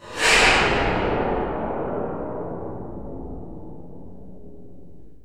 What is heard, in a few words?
STEEL VIOLA; Rarefaction; Poke in The Ear With A Sharp Stick